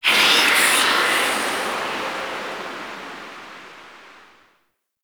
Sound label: Tailor Shop Door Whispers; Warframe; Ambience; Nineteen Ninety Nine